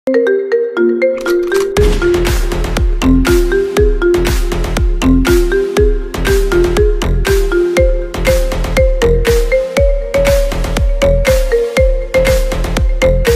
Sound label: piano violin; soundtrack; Bella Ciao; La Casa de Papel